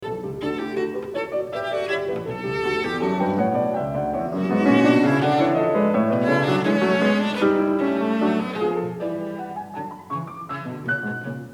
Cello sonata No. 5 in D Op. 102 No. 2 II. Adagio con molto sentimento d'aff; Beethoven